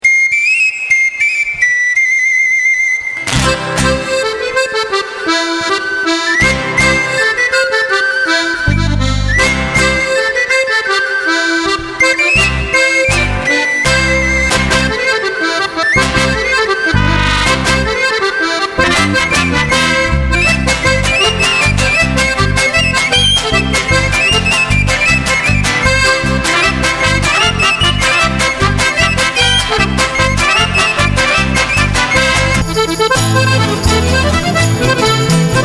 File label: Instrumental; Corridos